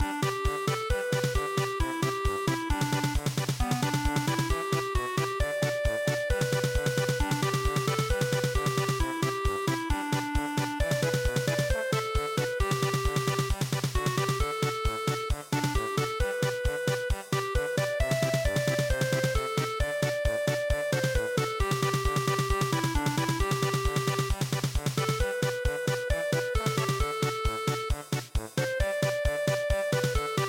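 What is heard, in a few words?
polka, midi; picnic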